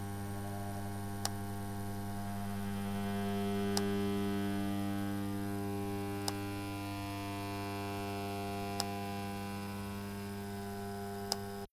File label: Vibrations